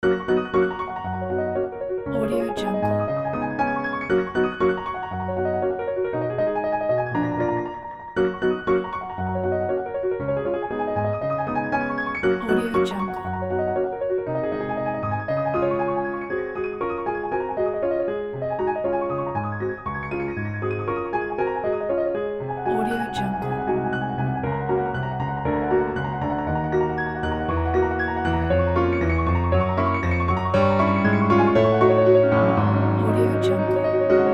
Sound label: chopin etude op10 no5 black keys; pinkycat